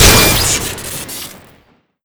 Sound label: radio gun shoot crit; tf2